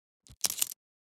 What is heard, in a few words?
lock picking